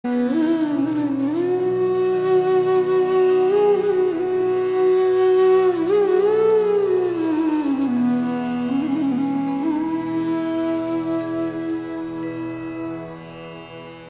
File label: Flute; ISKCON Swamis; His Holiness Lokanath Swami